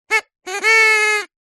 foley party whistle horn blow funny da da da; zapsplat

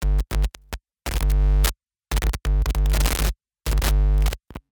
Guitar Computer Jack Noise

